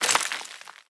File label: debris concrete, bullet impact debris